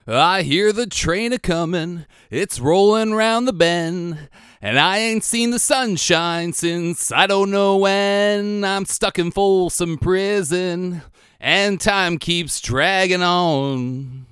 Vocal Sample with AIR Mode; 2i2 Studio; focusrite

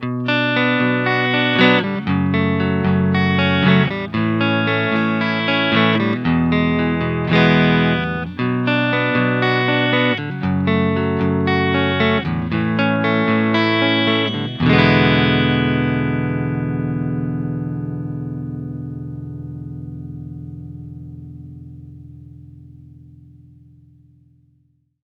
Orange Crush 20RT Clean sample

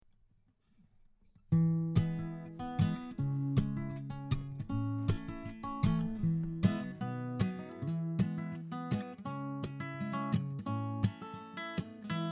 acoustic guitar microphone sample; Shure MV7X

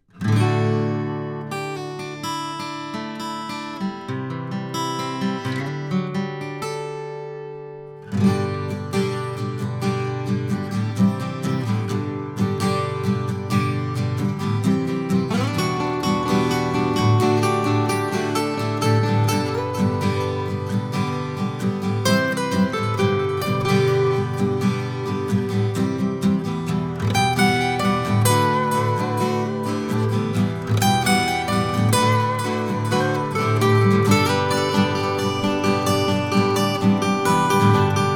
Multi Acoustic Guitars with lead; neumann u 87 ai

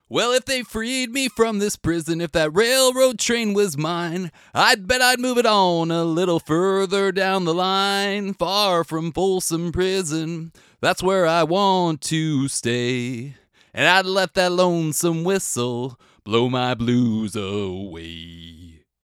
Singing Air Mode Engaged; Scarlett 4i4; focusrite

